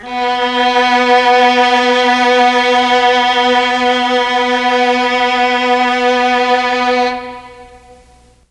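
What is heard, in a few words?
B1 violins, mellotron; CHMB 3 VLNS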